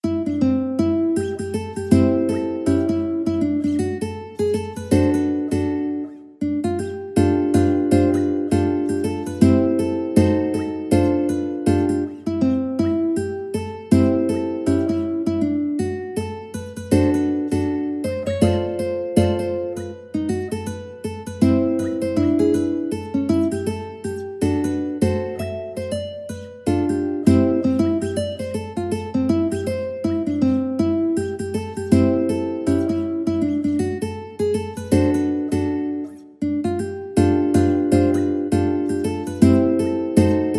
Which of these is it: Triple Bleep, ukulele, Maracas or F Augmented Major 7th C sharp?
ukulele